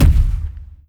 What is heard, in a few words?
bass soft, zombie blind step; Half Life Alyx; creatures